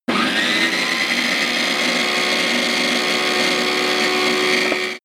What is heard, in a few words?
tile cutter free